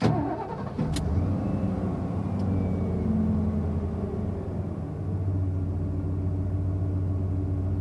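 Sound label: smooth steady start of an engine running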